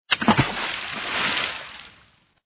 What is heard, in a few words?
video game splash